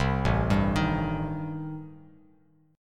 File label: A-Minor 7th Flat 5th, Bass Standard, chord; 8,0,5,8; Am7b5 arpeggiated; JGuitar